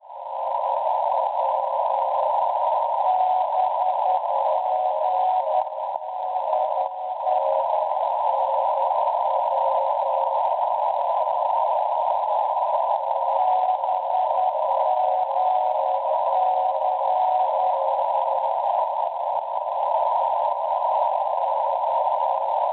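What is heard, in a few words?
non-directional beacon 374 kHz; BGC; Bergerac; Francia; Schwarzsee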